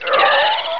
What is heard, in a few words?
moan; poke646